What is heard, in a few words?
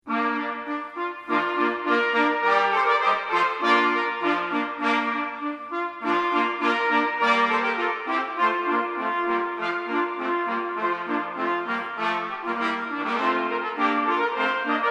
Heroic March, trumpet; No 3 – L’Armement